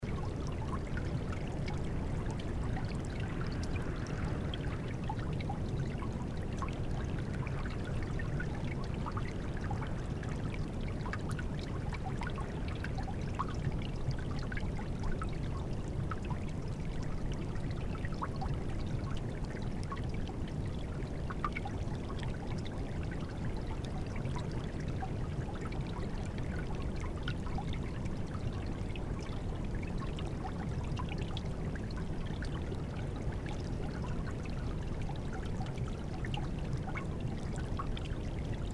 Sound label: Geyser Hill Stream Off Boardwalk Background Geyser Rumbles And Wind Binaural